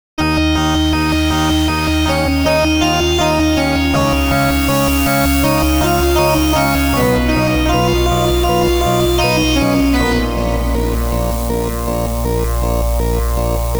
solar wind seq; the digital life

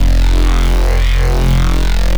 dubstep bass dry; wavefield

